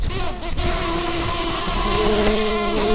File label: bumblebees, Pascuorum queens